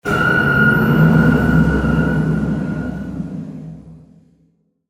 Hit To Whoosh; Production Element, Imaging Element, Accent, Transition; BlastWave

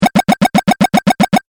Retro Game Repeating Sound

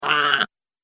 Goblin Little Grump, monster; horror

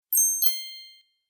Sparkle Interface Sound, beep sound effects

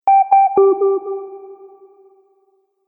Soft Subtle Notification; Musical

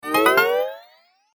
Cartoon Game Spell Wake Up, magic

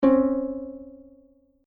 Incorrect Wrong Noise

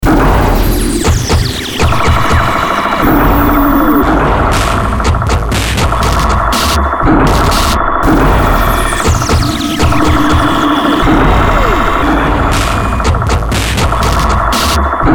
BEAT DISTORTIO, Beat Distortion Synth Drums; Miscellaneous Loops; Beat Distortion Drums Synth Sweeper Lead; Blastwave

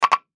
Error Tone Chime Wood Block Multimedia; Web Interactive; Chimes; Technology

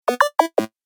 Retro Gaming Sound; Video Game Sound Effects

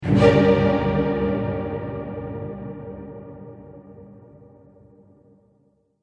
Orchestra Horror Sting; Stinger

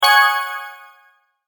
multimedia tone alert

